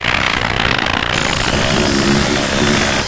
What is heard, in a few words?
get up, antlion; hl2